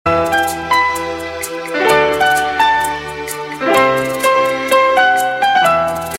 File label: Piano Tone; Saajan